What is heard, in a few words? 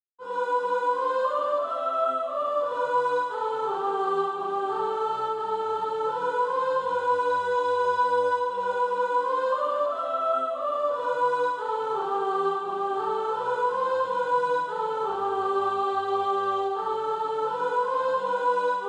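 soprano; A Meteor Bright Its Wondrous Light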